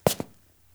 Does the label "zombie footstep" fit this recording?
Yes